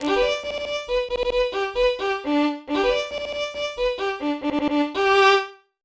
First Call Violin

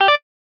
Windows Information Bar

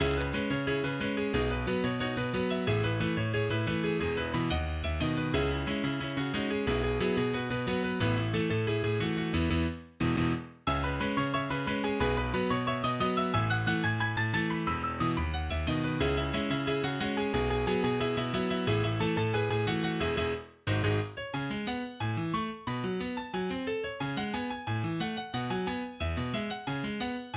piano full; MIDI; Fire Force Season 1 Opening Song (full version)

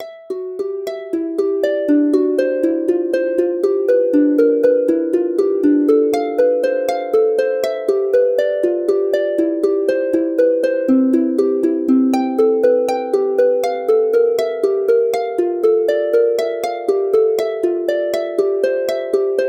Melody of Lute

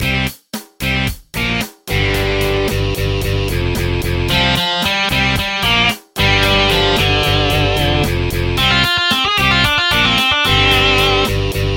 rock

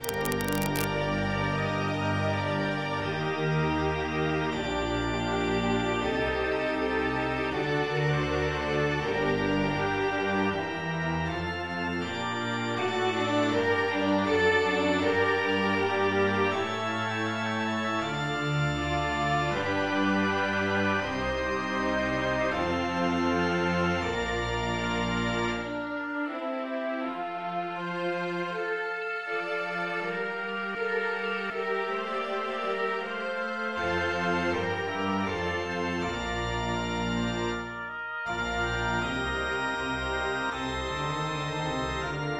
Concert in Bb major for 2 Oboe; Graupner, Christoph; GWV 341

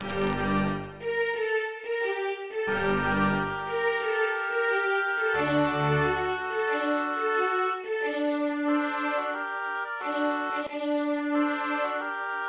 Concerto Grosso for 2 oboe in Bb major; Graupner, Christoph; GWV 342

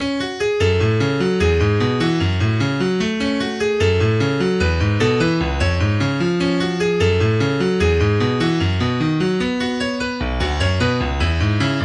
Blue Bird Piano

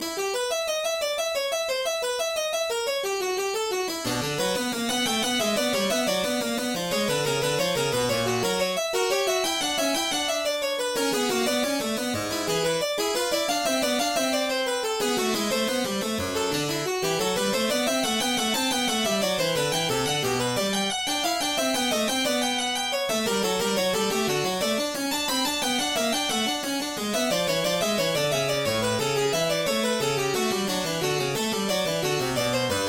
in E Minor; BWV 855

